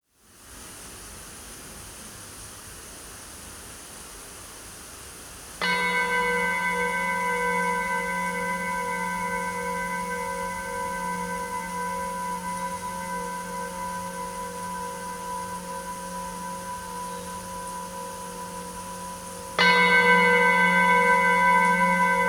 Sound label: soft rain with tibetan bells